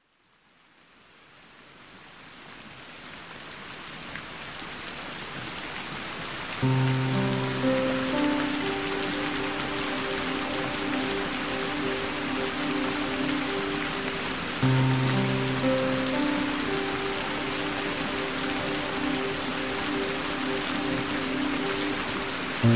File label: Rain and Music